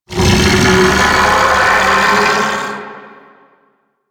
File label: Chain Reaction Beam Vocal; Warframe; Warframes; Qorvex